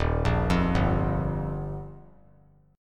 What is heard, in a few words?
Eb Major Add 9th; Ebadd9 arpeggiated; 3,5,8,5; JGuitar